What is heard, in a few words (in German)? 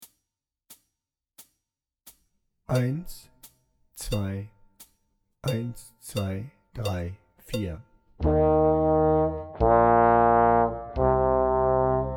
March Posaune lauter langsamer